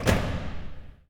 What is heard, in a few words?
cage open